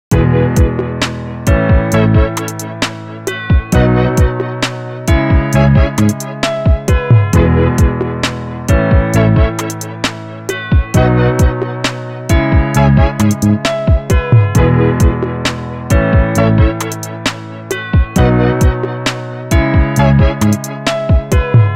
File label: gentle vibrato